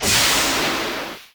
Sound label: Steam Sizzle Hit